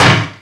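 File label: Collision Exterior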